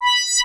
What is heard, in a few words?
Sci-Fi Electronic Scan Quick; Science Fiction